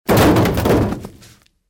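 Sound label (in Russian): прокатывается по машине и падает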